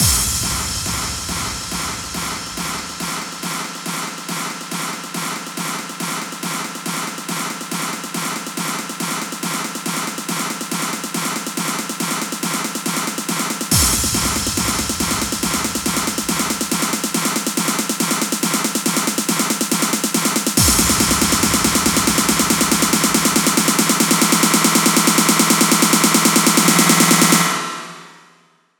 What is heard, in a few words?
Snare Build FX 140 BPM